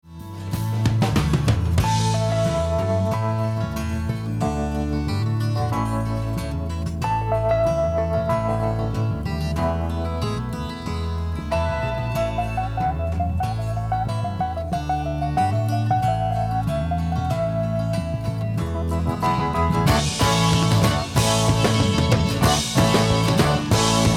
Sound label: solo